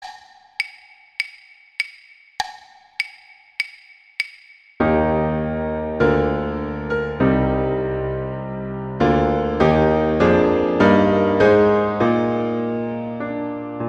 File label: O Canada acc; A Sax; Lavallee